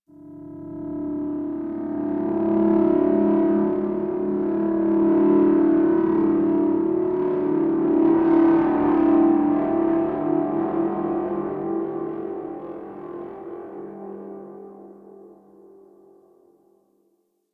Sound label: Gritty Pulsing Drone; Warframe; Ambience; Nineteen Ninety Nine